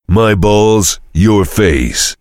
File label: heavy laugh er big snort; tf; duke